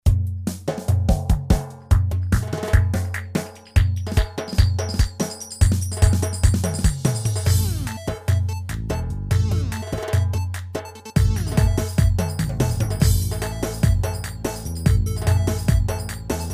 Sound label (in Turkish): izmir 9 8, yamaha psr a300tr v2 ritm